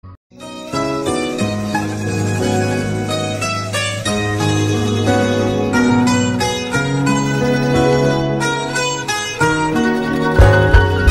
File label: heart breaking love music bgm